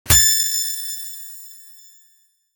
high pitch stinger; alert

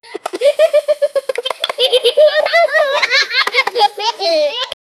joyful childrens giggles; blinking

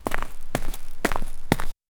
footsteps of a giant

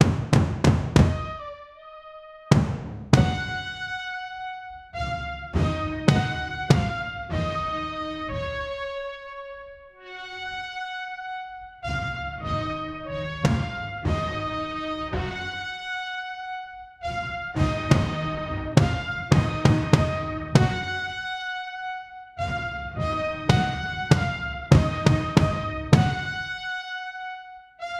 dark military funeral mar

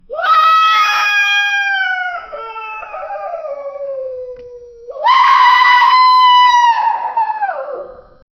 animal screams running; chaos

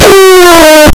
wolf death howl final

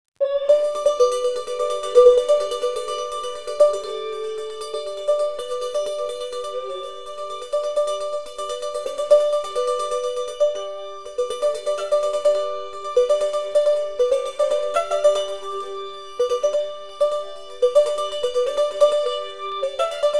a traditional albanian fo, folk

